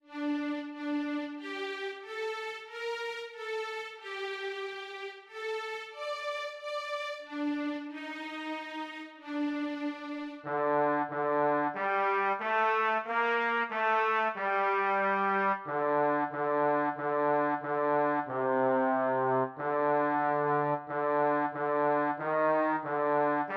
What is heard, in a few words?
bass